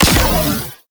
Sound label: Ice Weapon Beam Fire; Warframe; Corpus; Corpus Railjack; Spec Ops Ice Moa